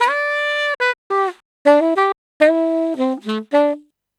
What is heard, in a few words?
Sax 100 bpm G, Saxaphone